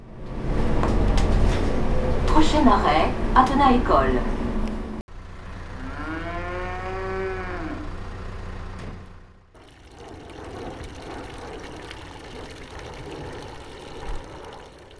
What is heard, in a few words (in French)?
école vache eau enfants; Athenaz